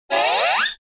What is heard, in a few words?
fiddle slide up